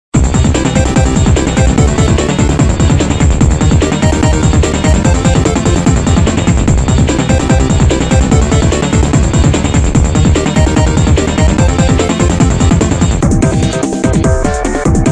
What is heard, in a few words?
battle bg; cstrike; bomberman mod